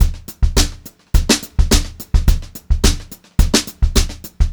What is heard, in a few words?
beat, Brushed Drum Loops; USB Soundscan vol.39; AKAI